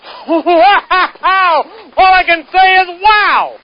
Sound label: wowow; svencoop